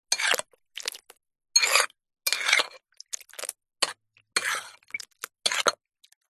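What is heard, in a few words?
food cereal in bowl with milk scoop spoon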